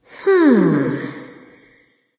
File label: thinking light; smite; voicepack; hel